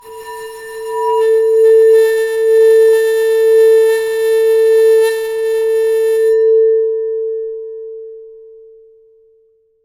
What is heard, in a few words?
Bowed, Large Wine Glass